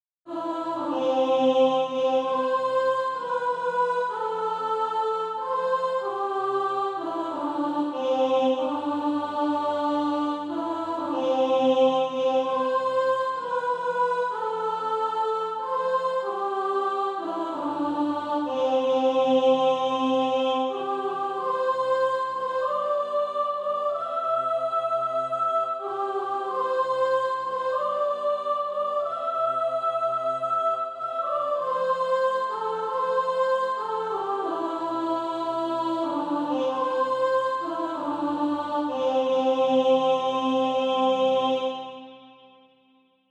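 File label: soprano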